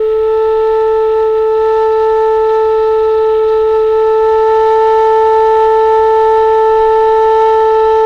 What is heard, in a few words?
KHLUI A3, KHLUI FLUTE; Spectrasonics; Heart of Asia